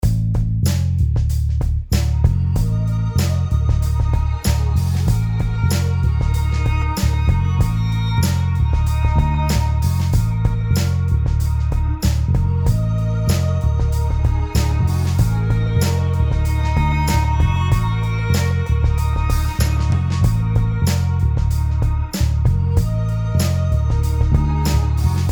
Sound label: song